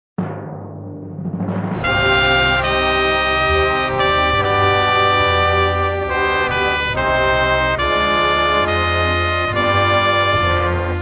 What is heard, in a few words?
Classical, horns